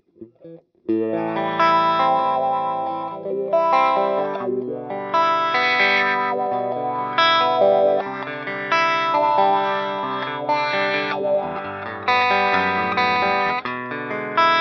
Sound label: Clean Picking